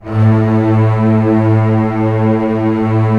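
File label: strings A1 FF L; Sound On Sound - Peter Siedleczek's Orchestra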